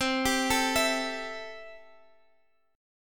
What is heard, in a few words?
C 6th C down Guitar Standard; C6 arpeggiated